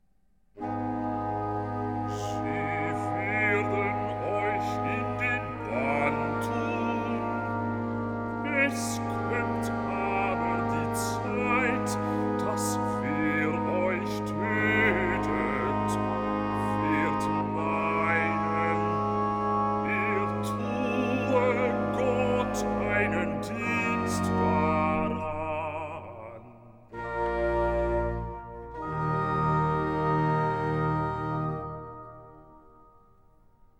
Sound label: Recitativo Basso; Bach Johann Sebastian; Sie Werden Euch In Den Bann Tun, BWV 183; Kantate Exaudi; Bach Edition Brilliant Classics